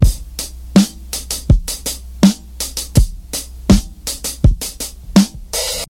82 bpm modern drum loop e key, Free breakbeat sample - kick tuned to the E note.